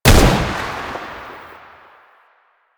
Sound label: AK47 Fire; Warframe; Tenno; Weapons